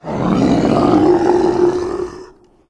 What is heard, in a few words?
zombie idle; zplague